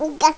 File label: baby; Game Maker 8